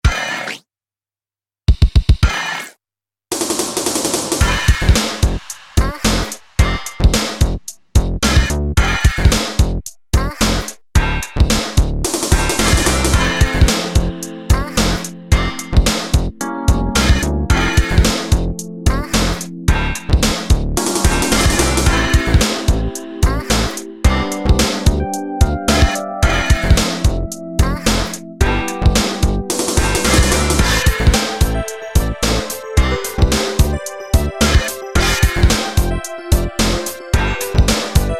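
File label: instrumental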